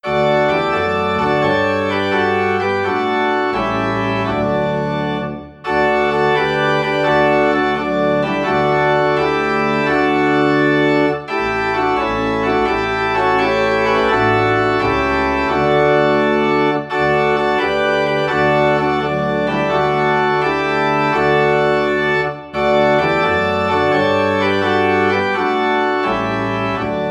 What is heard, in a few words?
Organ in D; Anywhere With Jesus